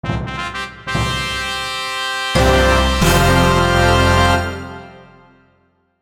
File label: fanfare; disney 4 duel crusade